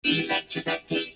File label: electricity